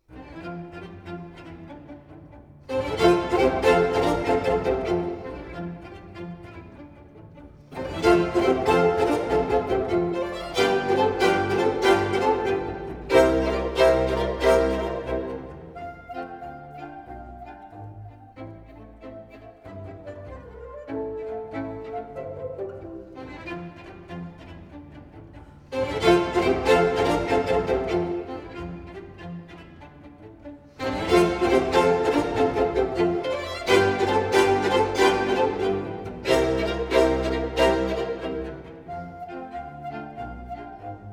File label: octet; op 166